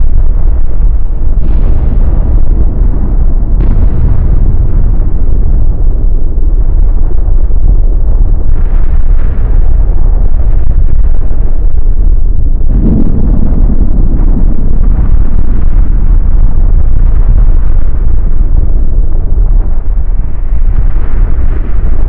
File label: thunder ambience; gauntlet seven sorrows